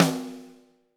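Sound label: snare drum, Drums; Bob Clearmountain Drums I; SD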